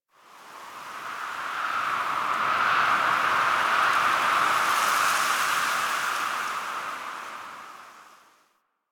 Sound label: Night Wind Gust Medium; Ambience; One Offs; Warframe; Eidolon Plains